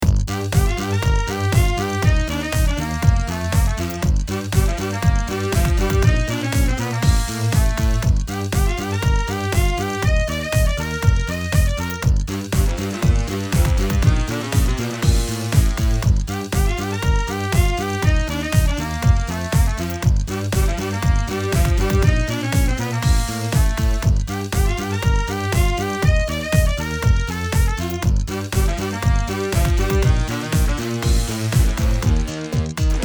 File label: disco sax